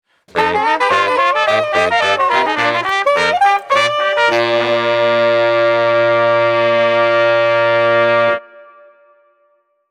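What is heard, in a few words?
Street Brass New Orleans Transitions, 95 bpm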